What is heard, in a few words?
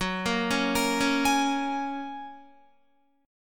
Gb Major Add 9th, down, Guitar